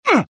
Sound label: Pain male